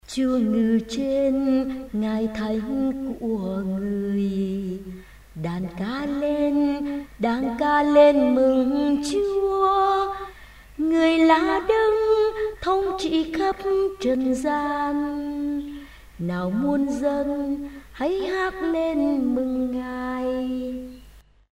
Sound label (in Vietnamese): vo tay hat mung